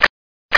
KRAB, BEACH; PARASOL

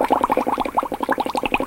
hero bubbles; diver jimmy

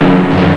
timpani drum, percussion instruments; Sound Effects for Multimedia - Volume 1

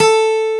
Acoustic Guitar A4; VSamp; Demo Bank

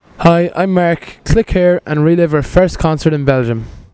concert; Joepie

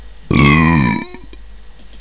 Burp; Prout & Burp & etc.

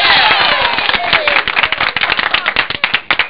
Claps; BlackIRC

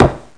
KNOCK

